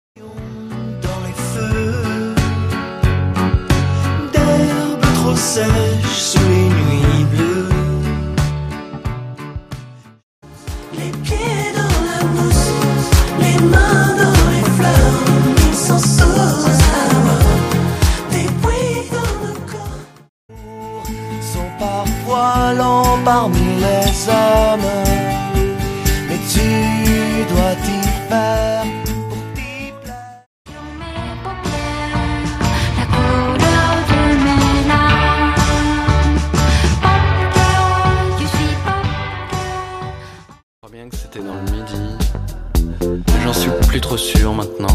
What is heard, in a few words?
midi; tim hortons